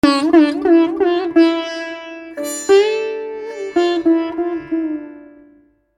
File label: Sitar D MIN FREE; Indian sitar; Indian Sitar Vol. 2